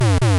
Sfx Free fall land; Pizza Tower early build test ost

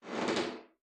Creak; Lethal Company; Windows

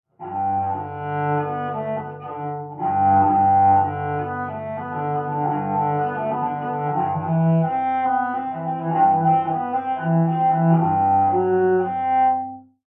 Contrabass; Assassin's Creed Syndicate

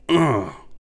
priest argh

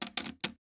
Poker Chips; This Is the Police

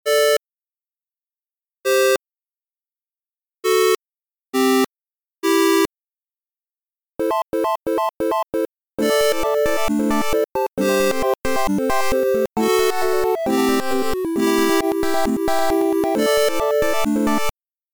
8bit loop; Tyke & Sons Lumber Co

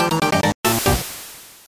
Professional Jingle; superlite 2500 custom mahjong

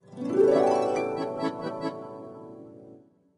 Music Intro; Diner Dash Flo on the Go; NDS

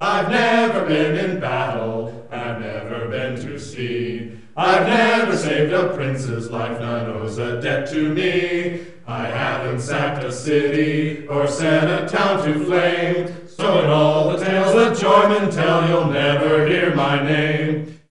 Tavern Song; betrayal in antara 1997 windows

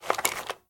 Fridge; Kodak

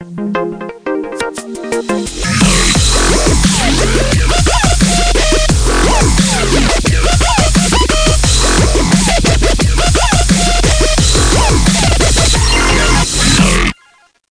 Dubstep; Learn to Fly 3

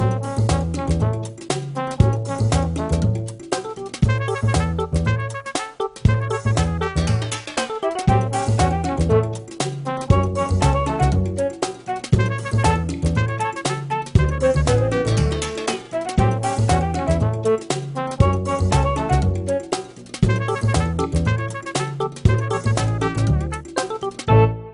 Win One Shot; sim theme park theme park world 1999 pc gamerip

